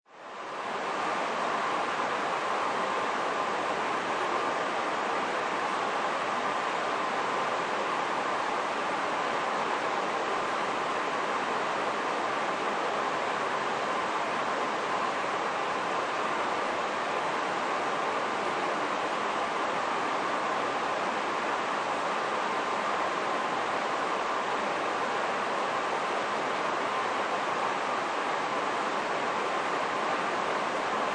Sports Crowd Large Outdoor Stadium General Crowd Noise